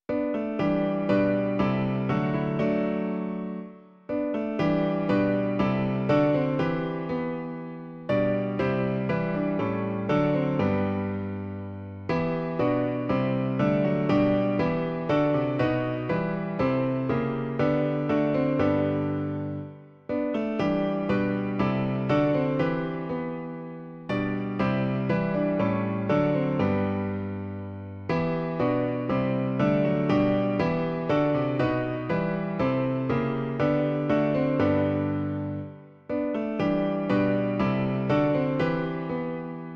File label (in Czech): klavír; Klavírní verze